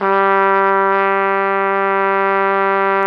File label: Flugelhorn; Roland LCDP12 Solo Brass